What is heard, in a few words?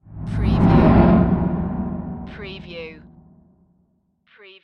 Whoosh; Sound Effects Bible